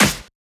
Snare; FLPRO

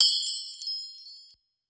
Perc, Boom Bap Drum

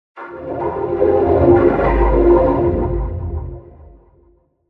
in Flute of God

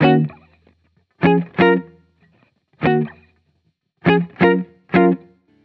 Electric Chord Loop 170 BPM F# Miaj; Cymatics

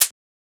Shaker

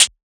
Perc, Percussion; FLPRO

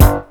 chord

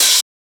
Open Hat; FLPRO

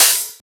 Open Hat; FLPRO

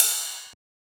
Ride; FLPRO

